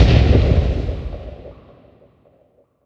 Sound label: explosion